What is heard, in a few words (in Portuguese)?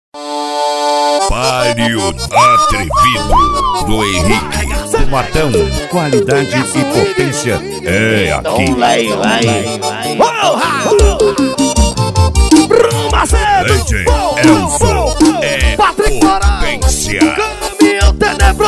forró sampleado; cd palio atrevido do henrique